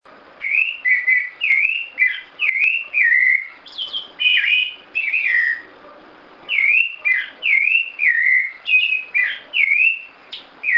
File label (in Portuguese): Sabiá Laranjeira; SEpifânio